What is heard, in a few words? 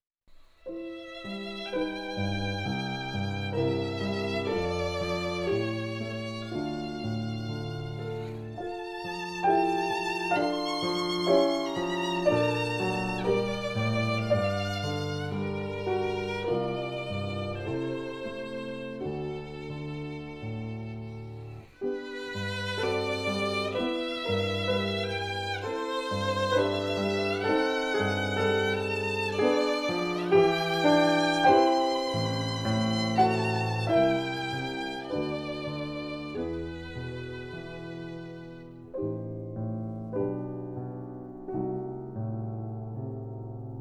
Reger Sonate